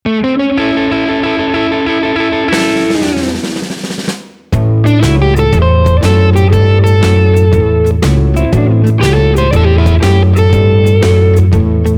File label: blues